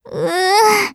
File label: combo break